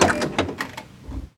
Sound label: Boarding Pass